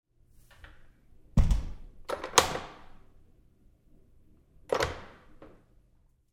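Door Bathroom Stall Close Close Up Light with Lock; Big Room Sound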